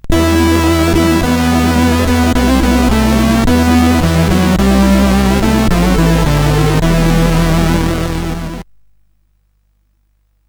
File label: Jingles, sid, CSG8580R5; Supremacy